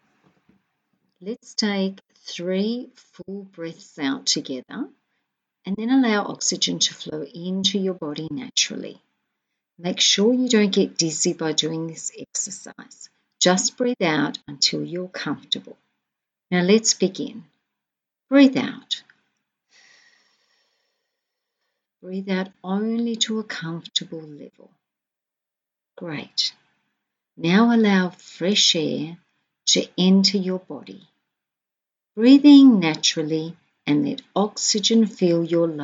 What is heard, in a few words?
Full Breath Out Voice only